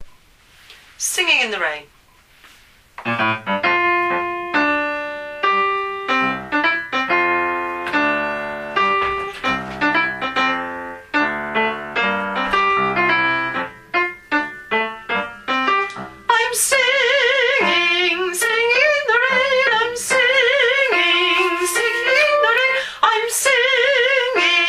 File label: Singing Sop 2